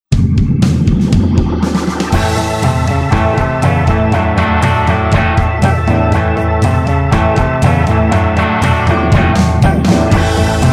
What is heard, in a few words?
Hammond Rock